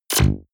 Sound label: sci-fi shock squeeze